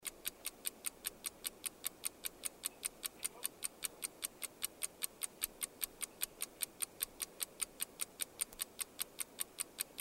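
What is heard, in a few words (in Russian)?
tikanie malenkih chasov, ticking of a small clock; Разные звуки секунд и секундной стрелки, тиканье настенных маятниковых часов с кукушкой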